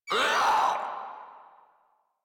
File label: Infested Crawler Pain; Warframe